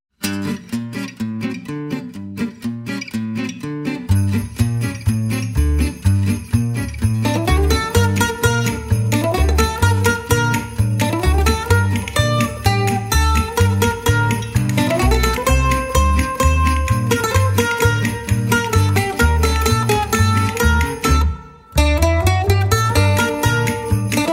Jingle Bells; Christmas; Paso Doble

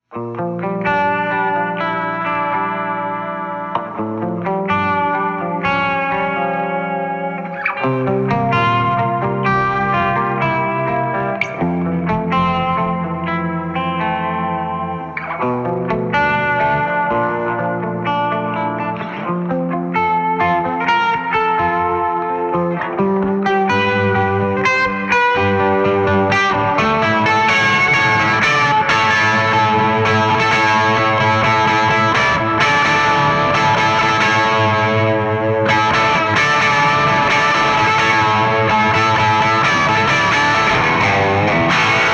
Les Paul Sine; EQD

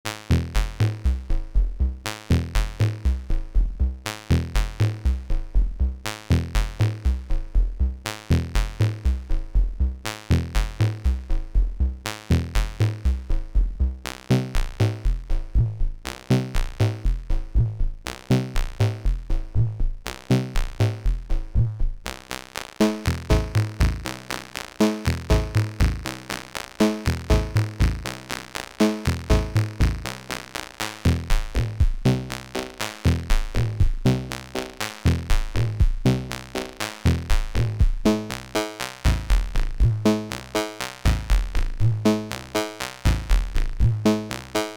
Modular Synthesis

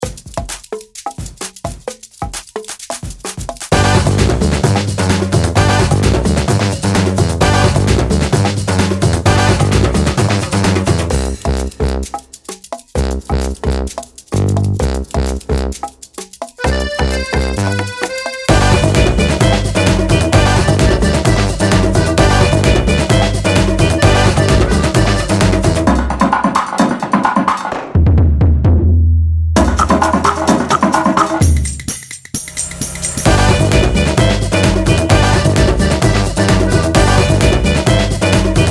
Latin Afro

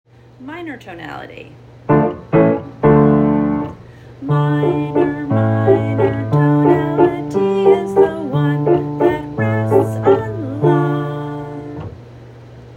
Minor tonality

